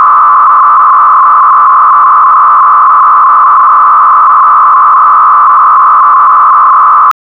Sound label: 300 Baud; FSK; FREIFUNK; freifunk intro